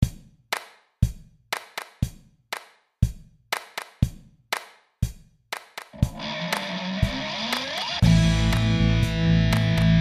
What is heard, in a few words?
Disco Pivert